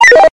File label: message tone ringtone; motorola